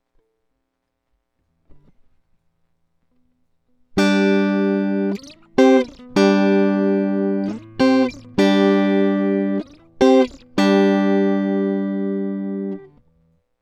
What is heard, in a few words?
e. gtr